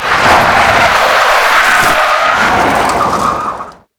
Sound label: tire skid dirt long; Call of Duty Modern Warfare